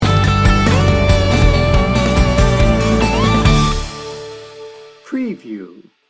win power sting bumper

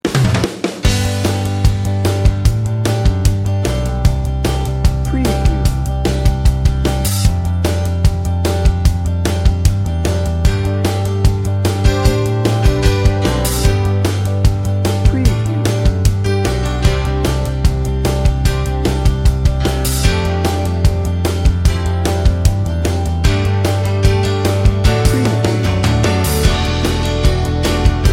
motivational pop rock bed

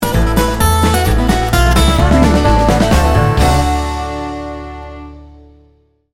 blue collar bluegrass sting bumper

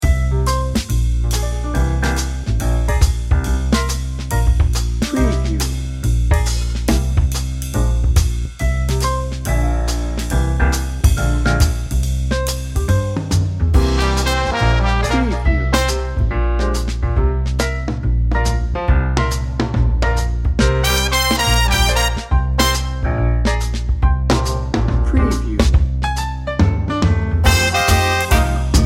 big band

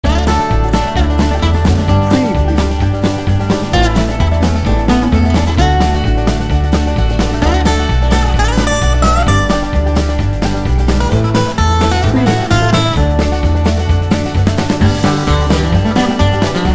blue collar bluegrass

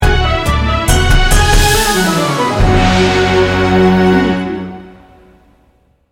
beijing adventures sting bumper

